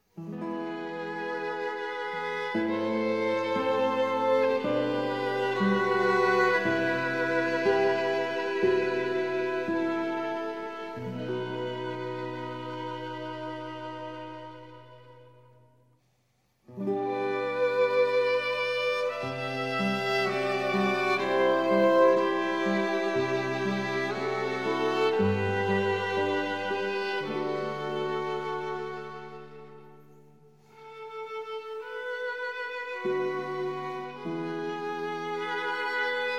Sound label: Trio Sonata in E minor Adagio